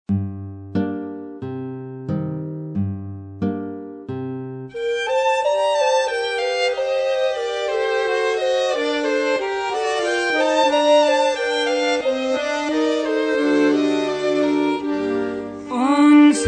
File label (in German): Finnland, Accordion; On suuri sun rantas autius